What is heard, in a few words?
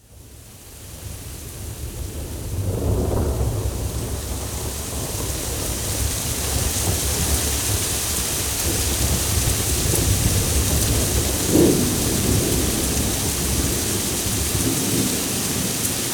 rain starts thunder; best service Blue Box